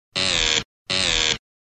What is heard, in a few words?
Buzz Buzz